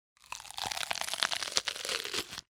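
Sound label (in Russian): медленно раздавливает пищу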